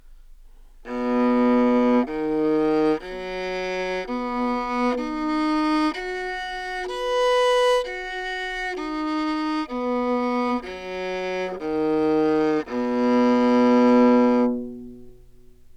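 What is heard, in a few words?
baroque viola arpeggio bow, Anechoic; OPENAIR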